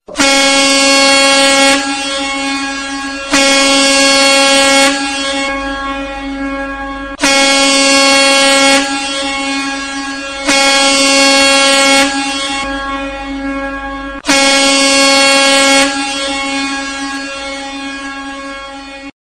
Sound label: Train Horn Loud